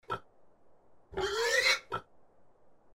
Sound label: pig; Farm